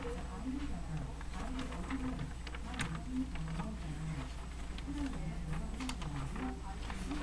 shake

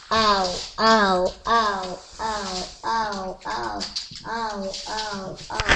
owl

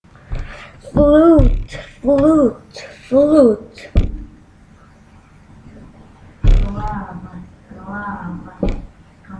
flute

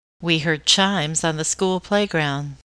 chime

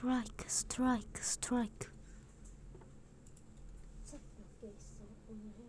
strike

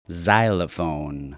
xylophone

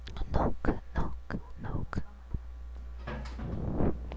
knock

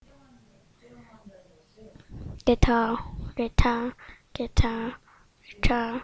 guitar

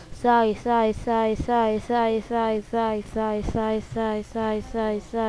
sigh

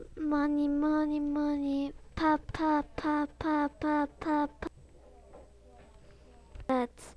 pop

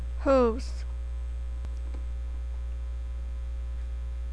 hooves

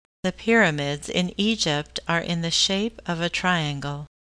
triangle

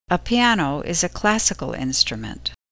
piano

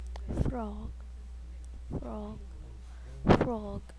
frog

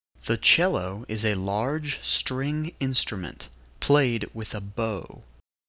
cello

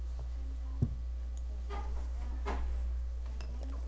cow

